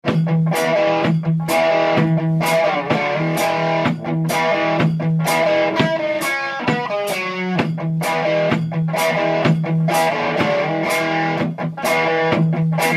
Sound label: Improv Jam